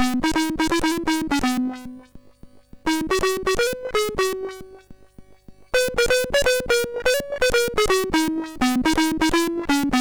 Old Bear, sid MOS6581R4

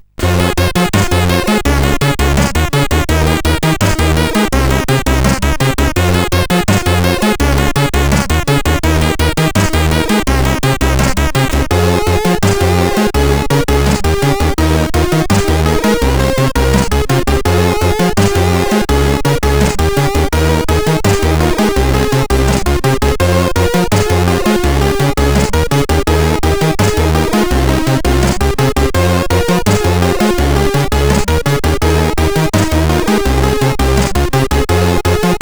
Groovy, sid, CSG8580R5

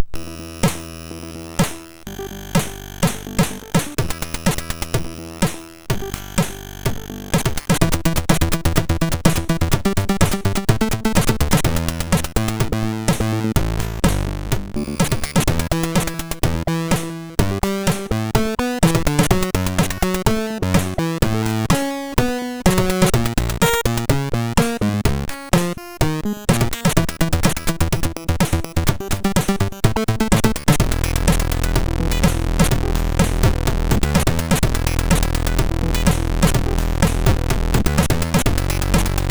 Untz, MOS6581R4

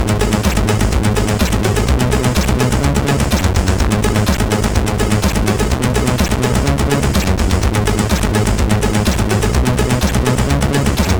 Develop sid MOS6581R4

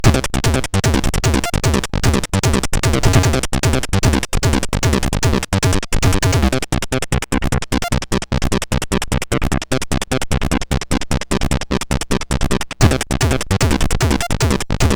Techno Rock, sid CSG8580R5; Early Tunes

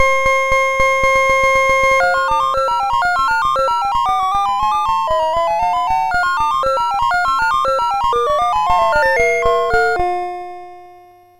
sid MOS6581R4, GAMES; Chinese Juggler

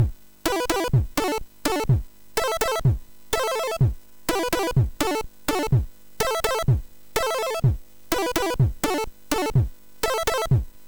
remix, sid MOS6581R4; Peggy; hvsc

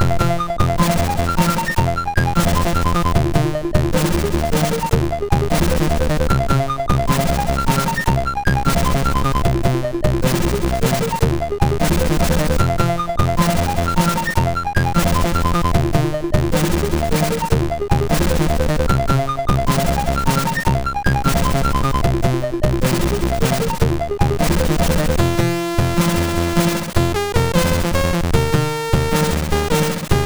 Blizzard part, MOS6581R3

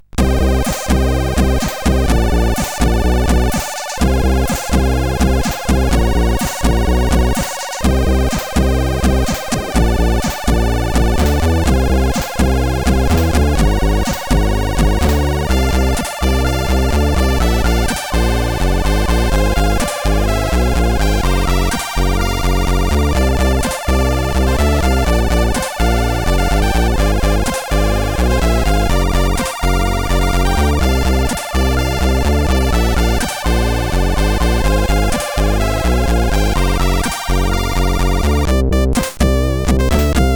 Jazz Rapp, sid CSG8580R5

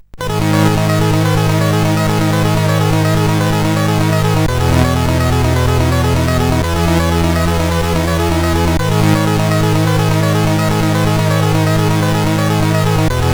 A Dead Spaceship, sid CSG8580R5

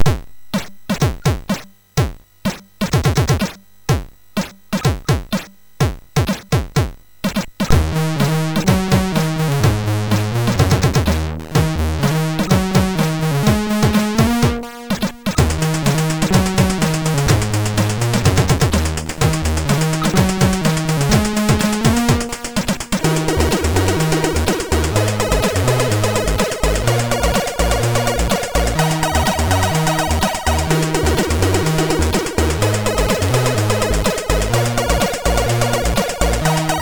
sid, MOS6581; Subway Zax

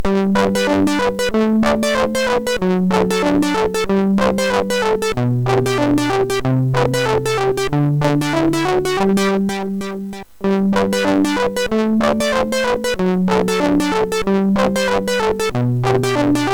Instrumental Compositions, sid MOS6581R4

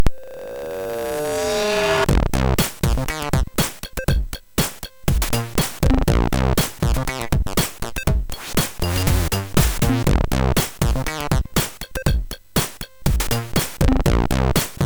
Happy Hip Hop, MOS 6581; MOS6581R3; hvsc

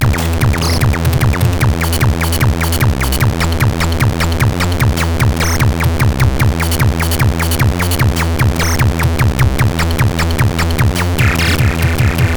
Tekkno Power, CSG8580R5; hvsc

